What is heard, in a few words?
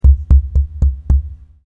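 sounds of tapping finger on microphone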